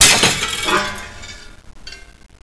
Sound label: glass, debris